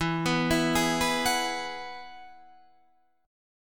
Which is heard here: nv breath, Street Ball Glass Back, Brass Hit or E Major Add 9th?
E Major Add 9th